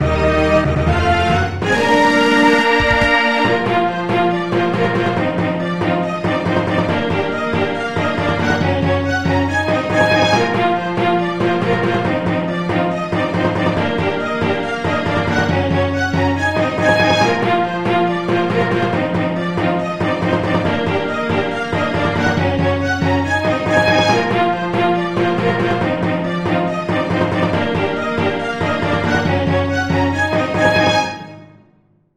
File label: Fanfare